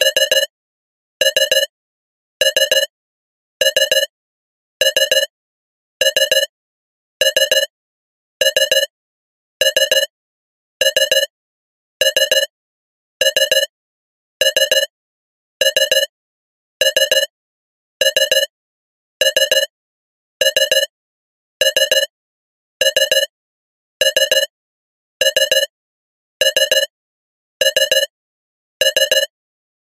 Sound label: science fiction alarm beeps digital 3 cluster slow